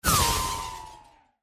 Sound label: Burst Descend